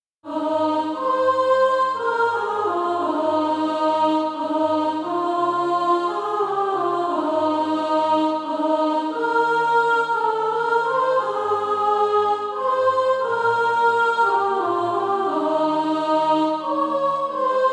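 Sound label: soprano, Practise Music; Dear Savior Take Us Home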